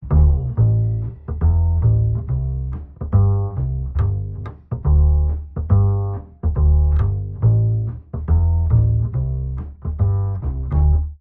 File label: Bass, Jazz for piano